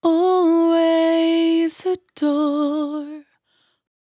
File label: 100bpm Bm, Velvet Vocal; Zero-G